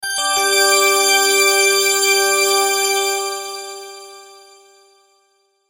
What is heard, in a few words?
Logo Organ Shimmer Reverb; Sonic Logo Sound Effects; Production Element Imaging Element Accent Transition